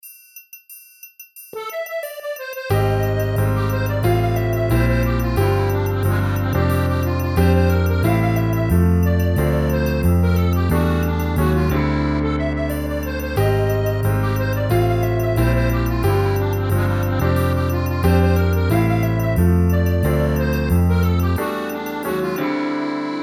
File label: Brasil Frevo Style